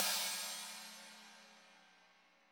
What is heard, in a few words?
CYM; Bob Clearmountain Drums I